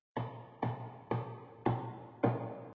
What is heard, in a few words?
Amb Pigcity Hammerhit